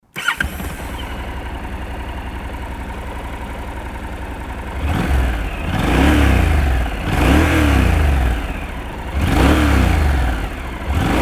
nc750s normal

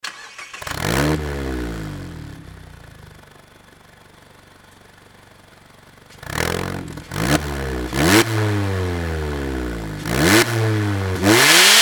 nd roadster fujimura bf; nd5rc

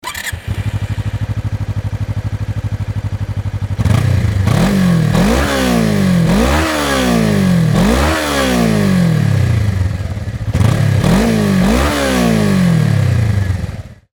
gsx250r normal

